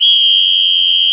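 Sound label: whistle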